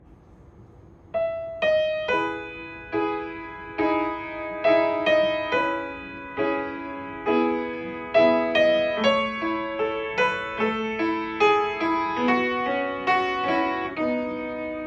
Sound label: Shenandoah solo piano